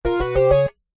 game sound synth tone bold complete

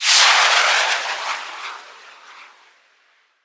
Jet Pack Away Take Off; Blastwave FX

